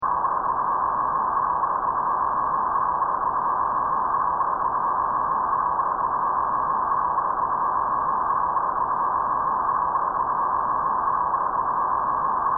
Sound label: Sci fi ambience loop, Scifi Ambience: Short Looping Element; Sci Fi Sound Effects; Sci-Fi (Sound Effects:Science Fiction); Stuart Duffield